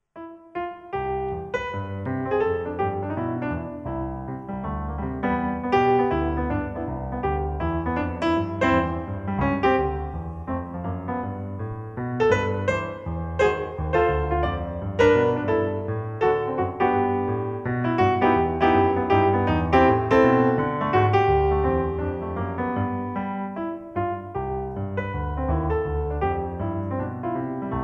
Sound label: solo piano